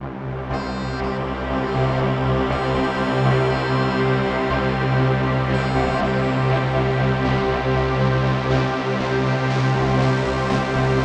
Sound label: FLOW SOUNDSCAPE C